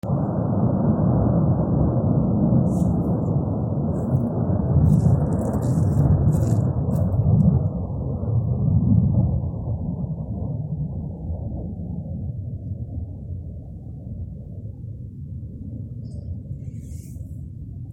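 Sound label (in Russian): взрыв ночью воздушная атака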